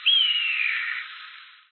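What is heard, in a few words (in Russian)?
одиночный крик с эхом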